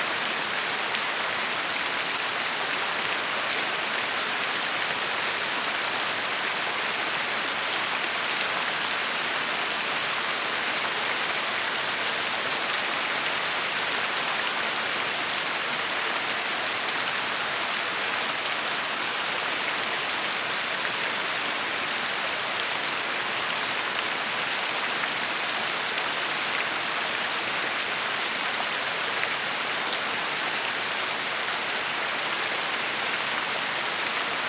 FOUNTAIN Large Massive Sheeting Greenhouse Cascade Close